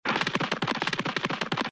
run; disney; mashup

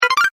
score points; Wreck-It Ralph

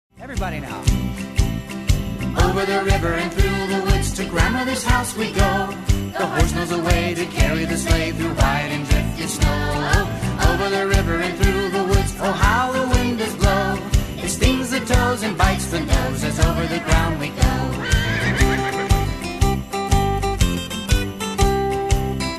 karaoke vocal; childrens favorite songs; disney

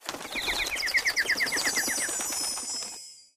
navbar open; princess

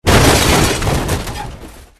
crash kart; disneyxd; stuntastic

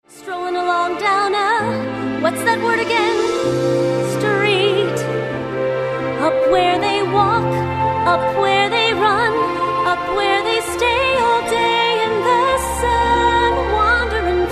karaoke vocal; princess; disney

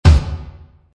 kick drum

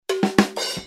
prat Fall; disney; mashup

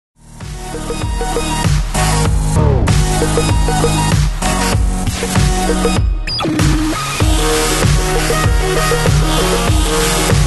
remixed glitch; tron; disney

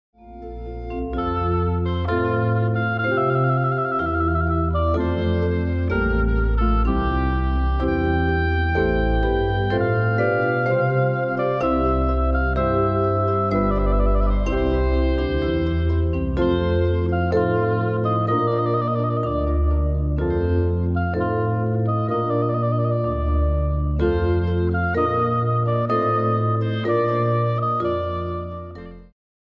water music; baby einstein; disney

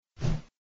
move right; toystory; hamm

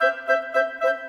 Cinematic Winds; pooh; merry games; jammin

